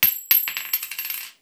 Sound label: coin; 10円玉を床に落とした音